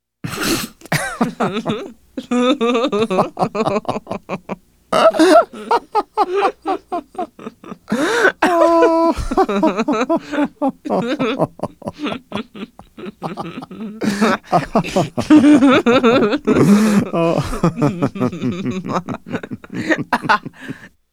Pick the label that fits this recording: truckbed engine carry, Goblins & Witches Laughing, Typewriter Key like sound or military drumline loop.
Goblins & Witches Laughing